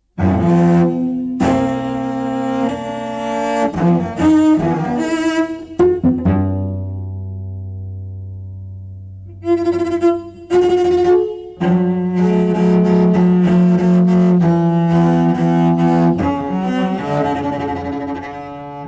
Furioso, Cello Solo

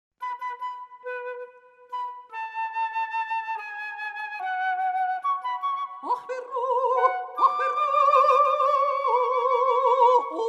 mezzo, flute and cello; sung in Arabic

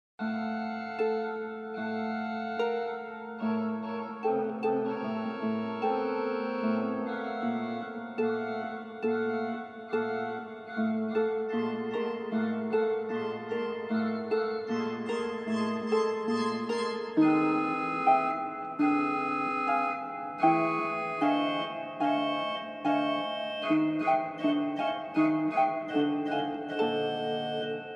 Pick-up, organ and harp

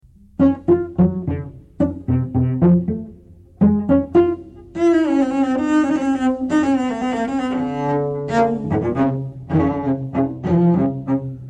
Allegro alla scherza, Cello Solo

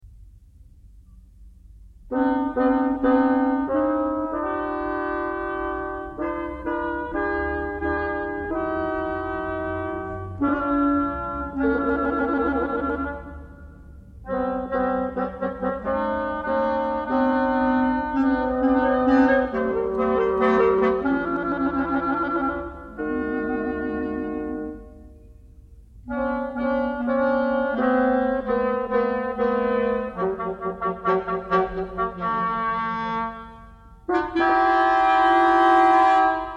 Clusters, oboe, clarinet and bassoon

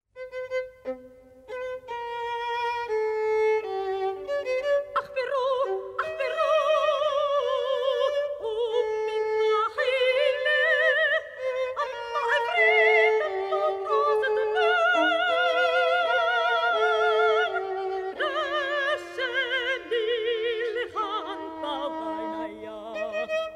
soprano, oriental violin and oud

